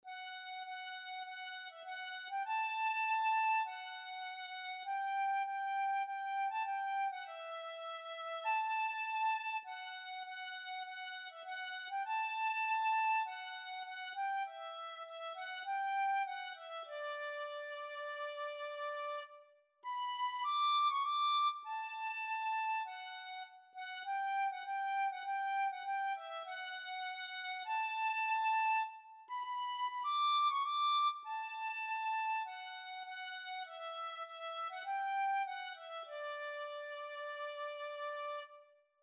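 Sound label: penny whistle, tin whistle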